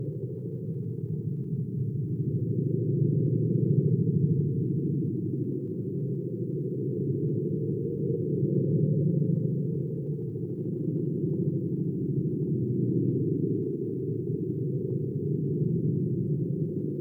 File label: Cockpit Amb; Railjack